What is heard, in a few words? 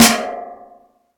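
long room reverb snare drum sample a key, tuned to the A note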